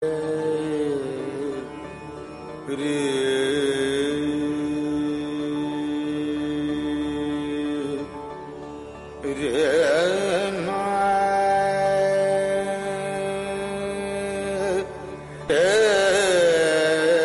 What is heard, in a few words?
background music; Devon Ke Dev Mahadev